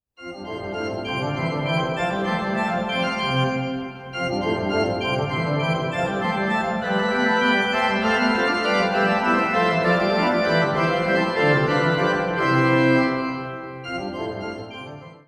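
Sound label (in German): Allegro Concerto F Dur, Orgel; Johann Sebastian Bach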